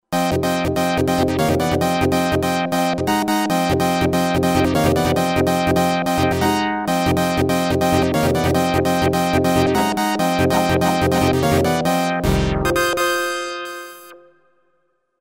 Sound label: Elektro Chord, Roland V-Synth; Factory Patches